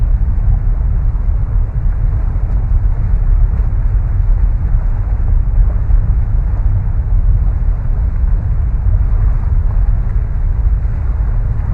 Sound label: Hidden World, lava flow; ice age scrats nutty adventure